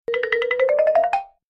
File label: Cartoon running movement funny